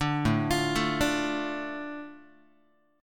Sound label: A Suspended 4th Sharp 5th, A, Guitar; Asus4#5 arpeggiated; JGuitar